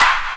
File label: CLP HOP; E-Lab; X Static Goldmine 2; akai